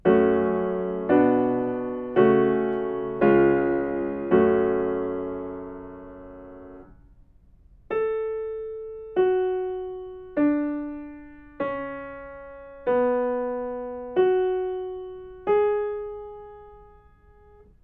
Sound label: melodic fragments